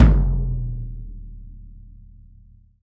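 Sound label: bass drum open; E-MU Producer Series Vol. 7 – Old World Instruments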